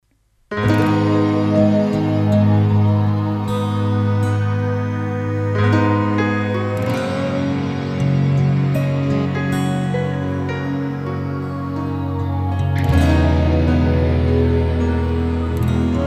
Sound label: Piano Dream